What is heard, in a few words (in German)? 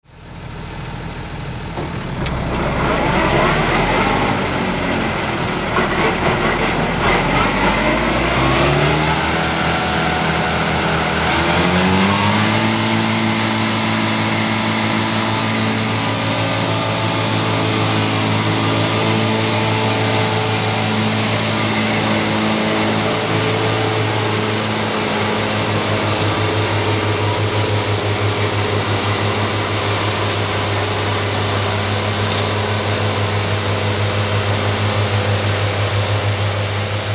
Fendt mit Claas Maehwerk, Fendt Vario 926 mit Claas Disco; Motor: MAN; Leistung: 271 PS; Hubraum: 6.8 Liter